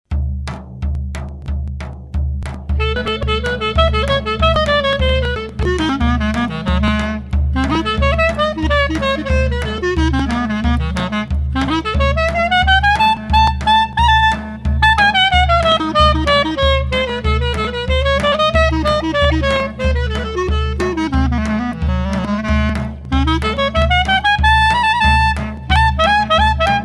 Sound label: tale jazz